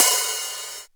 tamed open high hat sample f key, drum single hits one shots